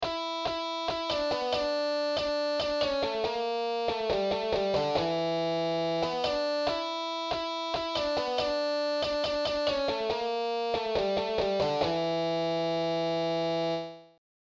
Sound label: Solo